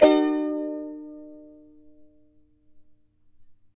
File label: CHAR E MJ U, charango; Spectrasonics; Hans Zimmer Guitars VOL-2; CHARANGO CHD